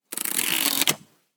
book flipping; everyday objects

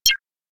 digital spit; sound effects

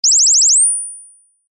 wake up tones; oringz pack nine

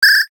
pungent; standard ringtones

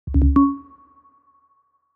time is now

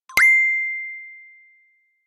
swiftly, notification sounds

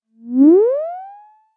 awareness, message tones

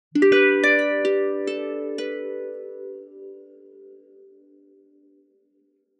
harping all the way, christmas ringtones

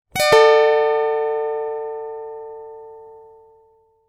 wake up tones

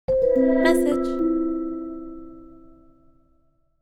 message with intro, notification sounds

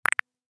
jokingly notification

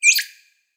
tasty

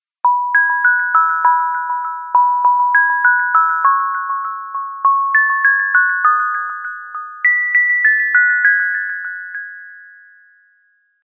dreamy christmas bells; christmas ringtones

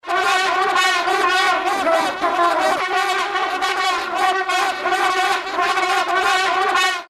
noisy annoying vuvuzela sound

